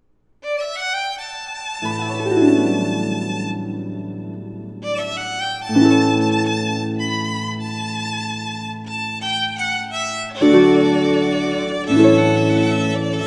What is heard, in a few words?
Harp